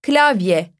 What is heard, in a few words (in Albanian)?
klavye